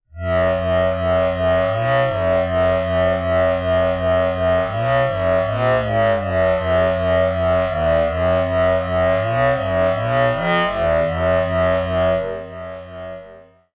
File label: Synth Mono